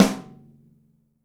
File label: snare, gretsch; Dirt-Samples